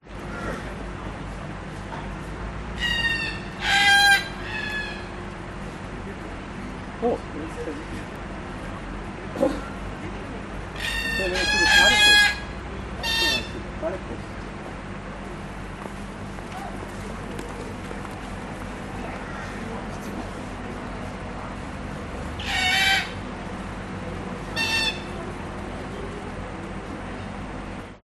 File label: public places zoo cockatoos people